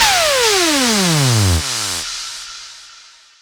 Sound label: FX Sweep Down, 140 BPM; Vengeance Samples; Song Kits; One Second